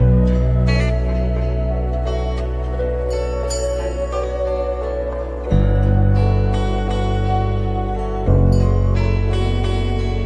keys big bass

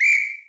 whistle

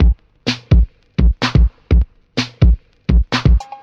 dingaling, Drum loops, Electro

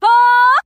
"ah" SUNG, Voice

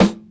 hoo, Snares Knocks

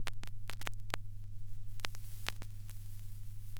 crackles, Vinyl

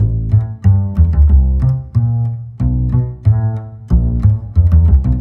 bass chunky, Loops

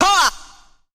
"ha", Voice; ooh ahh

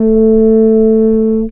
Horns

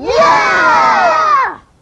"yeah" kids cheer; ooh ahh

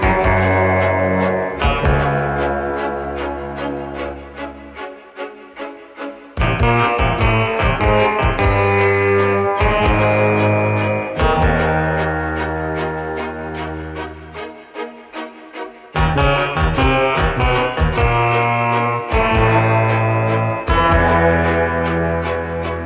strings, Sad Loops

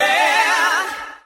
"yeah" sung

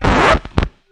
lift, Vinyl; Misc Percussion

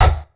chips, Misc Percussion Ticks

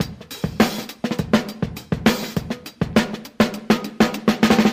Drum loops, Funky

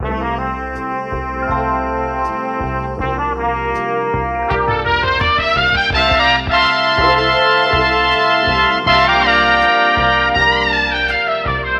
horns, Loops, Phonky

